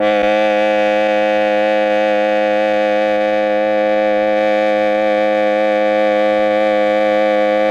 MEL OBOE

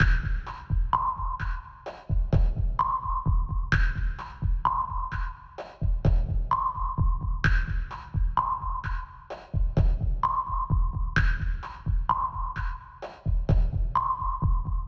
lo-fi loop, Drum Tracks, 064.5 Cmin; Big Fish Audio; Legendary

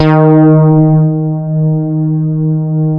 SH 1000, BASSES

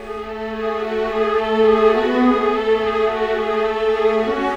Cinematic Viola Violins; Orchestral; Sonic Foundry (Sony Creative Software); Orchestral Series VOL-3 - Cinematic

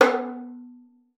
FING B3, VIOLIN; Xsample VOL-5

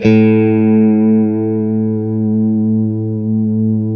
A JC120; Spectrasonics; Hans Zimmer Guitars VOL-1; JC-STRAT MF

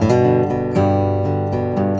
GUITAR 120

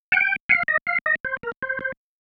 Single Riff, Organ

